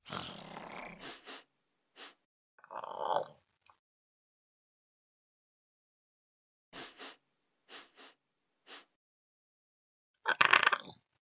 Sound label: Dragon Baby Idle